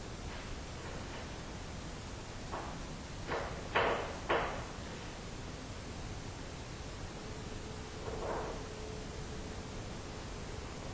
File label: EVP; Fort Tejon; This audio was also caught in the officers quarters